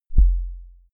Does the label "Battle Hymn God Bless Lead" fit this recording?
No